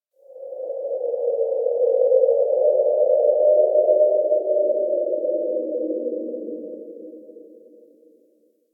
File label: Forest Cave Howl Mid; Ambience; Warframe; Grineer Forest